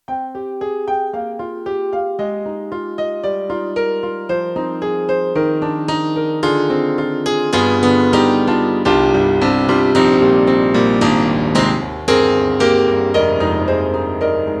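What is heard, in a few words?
YAMAHA MU1000EX Bright Concert Grand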